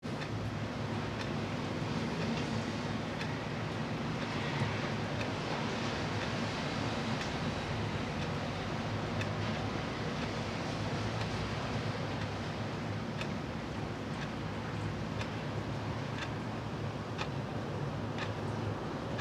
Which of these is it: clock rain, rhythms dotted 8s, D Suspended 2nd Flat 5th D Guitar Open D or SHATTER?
clock rain